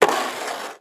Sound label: Weather Snow Shovel Scoop Heavy Snow On Cement